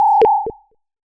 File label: Cry